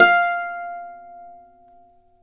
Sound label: Celtic Harp, Plucked Strings